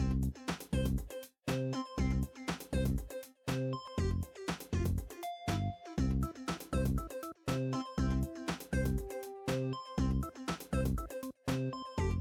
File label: music cheerful; bgm